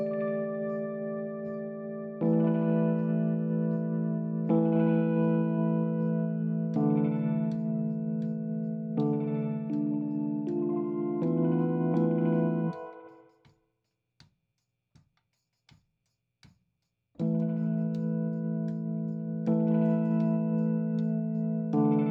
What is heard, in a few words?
rhodes